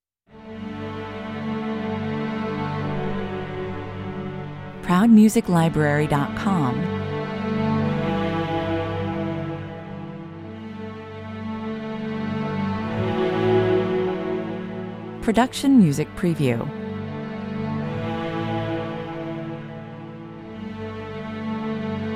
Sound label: denouement (no drums) 96 bpm